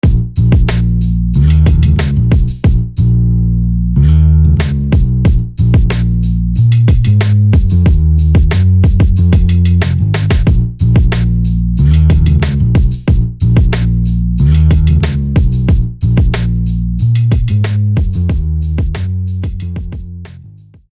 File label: Bass; Bass It On Luck; SFB FX